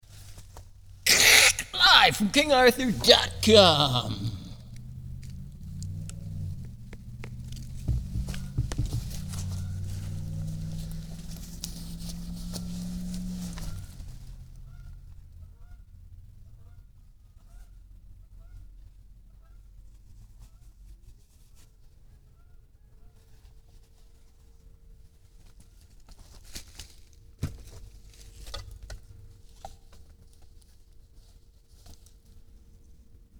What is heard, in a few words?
chain saw raw